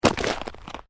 Footsteps Boots Volcanic Rock Step; Footstep Foley; Footsteps (Sound Effects:Human)

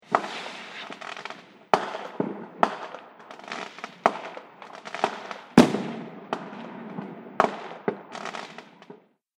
Fireworks Various Fireworks; Firework Sound Effect

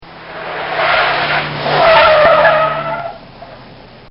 Fiat Driving Away; Cars; Vehicles; Bobby Cole

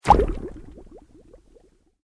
Sweep Organic Fast

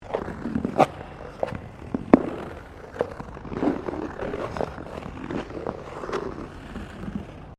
Ice skating Turn Maneouvering, Figure skating; Ice Skating (Sound Effects:Sports)

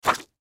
Sand Paper Set Down; Sanders; Machines; INDUSTRY; Blastwave

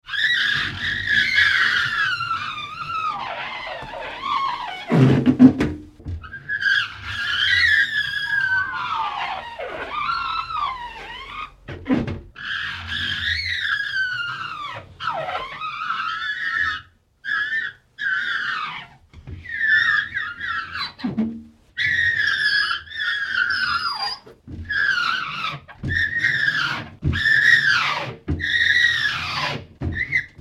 Horrid Glass Squeals and Squeaks

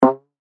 Hit Thump Hollow, Cartoon; Cartoon Sound Design (Sound Effects:Cartoons)

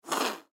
Leopard Hiss Light; Leopard Sound Effects; Animal

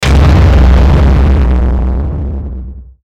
Hit Distorted, Hit Distorted Impact; Distortion Bright Burst Reverb Production Element Imaging Element Accent Transition; Blastwave

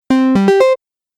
Stage Level Complete; Video Game Sound Effects

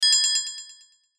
CHIME BELL DELAY; Multimedia; Chimes; Blastwave

